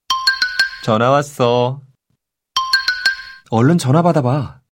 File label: Zen ringtone